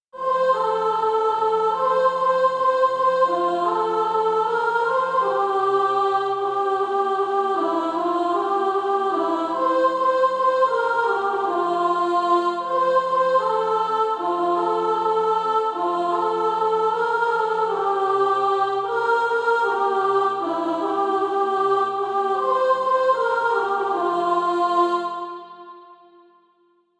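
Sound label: soprano, Practise Music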